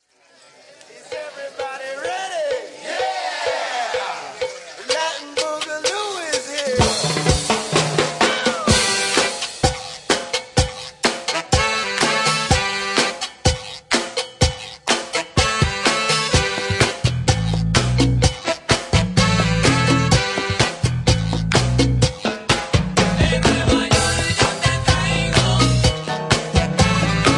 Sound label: Latin Party